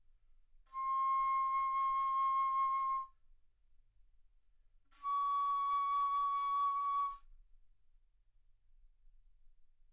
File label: Bass Flute C6 Db6; ff